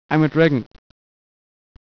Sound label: idle, dragon